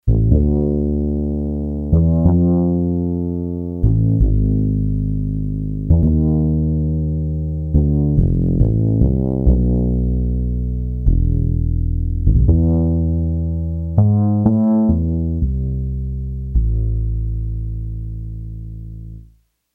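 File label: Smooth Bass, Yamaha TX802; Factory Patches; SmoohBass; Voices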